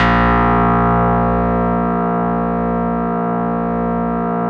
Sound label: RESMET2 A#1, Yamaha VP-1, RESO METAL 2; Music Store; 90's Sample CDs